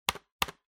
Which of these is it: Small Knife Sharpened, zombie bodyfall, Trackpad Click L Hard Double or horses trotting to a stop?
Trackpad Click L Hard Double